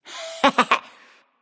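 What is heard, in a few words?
heh heh, female; smite; voicepack; hera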